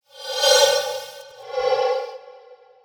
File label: Breathe Gas